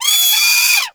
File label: Sea Bird Vocal Death; Characters; Warframe; Ostron; Hunting Critters